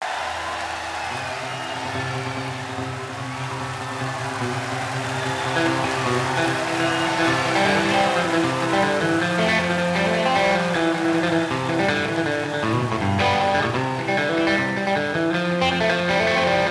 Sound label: Guitar Rag